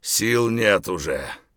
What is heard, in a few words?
tired; Escape from Tarkov